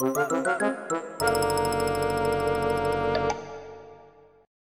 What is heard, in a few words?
Gag; ycrpg